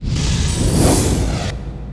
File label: Trap Flash; RYL 2 v1673; Private RYL